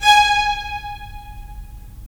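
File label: strings violins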